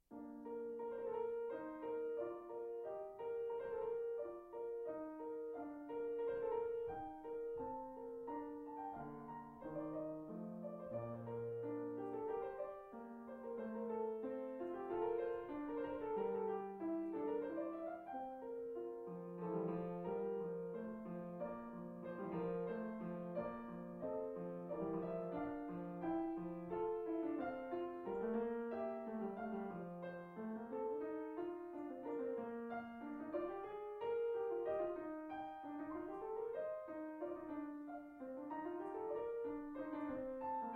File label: Piano; Bach Partita No 1 Prelude BWV 825